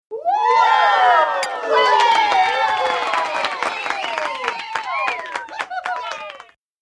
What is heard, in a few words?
Group 12 Yr Old Clap